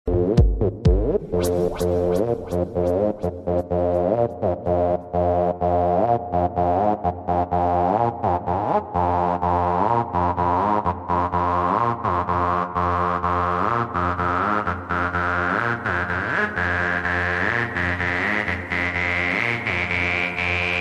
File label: metal trance, ringtone